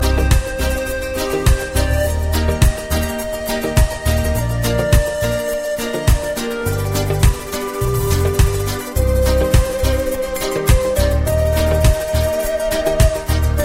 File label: Flute; Flute Maker